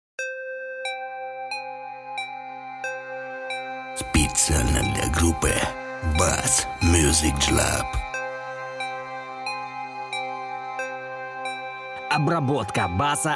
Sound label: Bass